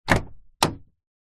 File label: truck small door open handle plastic, vehicles